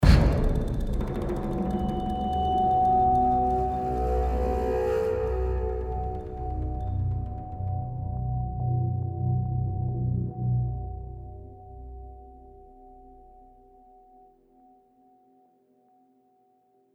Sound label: Medium Robot End; Horizon Zero Dawn; PS4